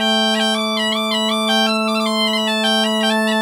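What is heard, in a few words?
bagpipe 160, Celtic Flavours; USB Soundscan vol.42; AKAI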